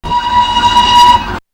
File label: whistle, castle class